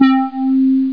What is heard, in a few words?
mellow synth hi; OctaMED SoundStudio V1; jazzies samples